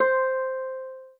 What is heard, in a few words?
spanish guitar